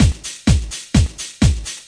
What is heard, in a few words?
127 bpm shakedown